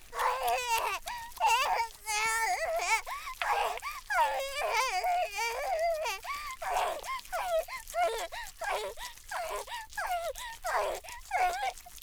"あへぇ"

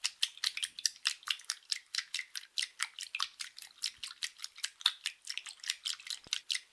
水音効果音, ストローク中早

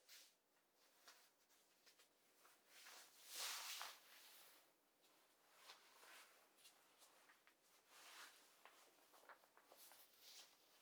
ズボンを脱ぐ

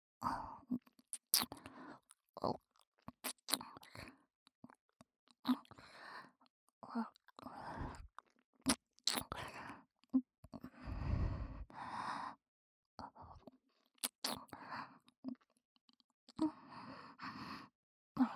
耳舐め 左

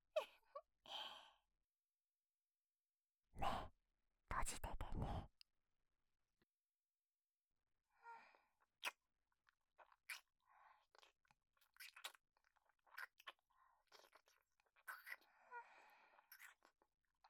キス・耳舐め・耳舐め手コキ

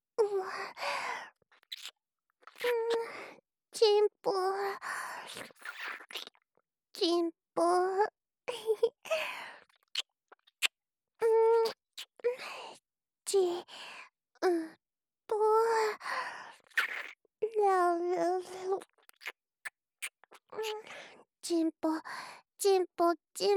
キス

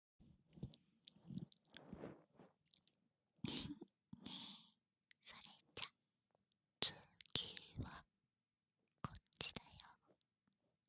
耳舐め 左耳, ASMR; DTM女子の耳舐め

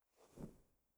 布ズレ; 効果音

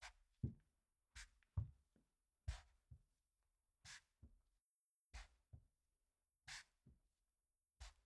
たたみ足音, ASMR; 効果音

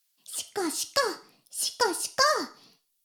シコシコ音声; 古き城のドラゴニート

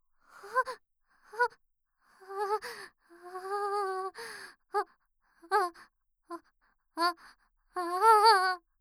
お? おっ おおっ おほぉ…

